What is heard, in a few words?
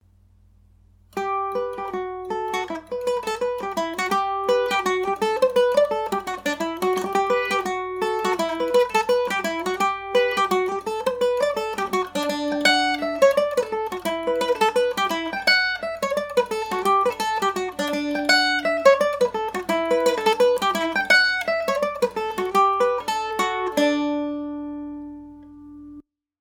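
E minor md304, Irish mandolin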